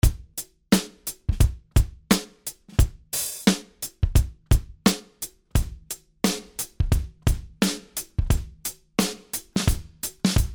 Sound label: Acoustic 2; Kobalt